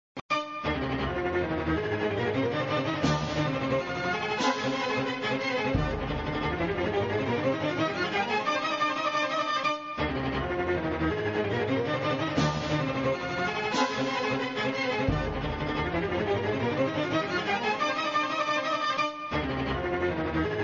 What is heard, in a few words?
Music Jackpot Lit looping; Indy